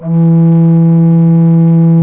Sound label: flute low